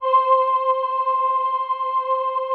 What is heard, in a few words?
VP-330, String Machines